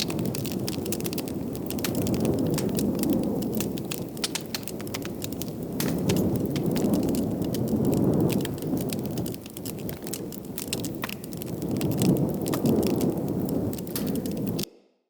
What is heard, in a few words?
Fire Hazard Burn Pile Large Loop B; Undercity